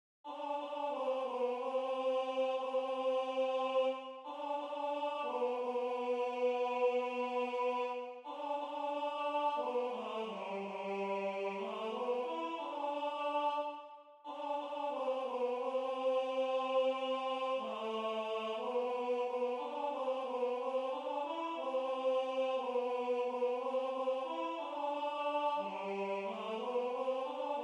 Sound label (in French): tenor